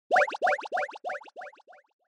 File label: Fantasize Short; 妄想; 短; シーン・妄想